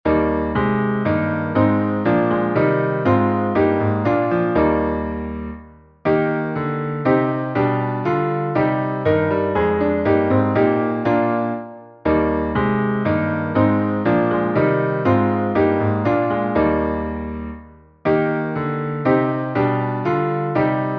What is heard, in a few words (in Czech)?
klavír; Tebe Bože chválíme